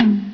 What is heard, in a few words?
HIGH TOM; Dark Forces Demo; Dark Forces Instruments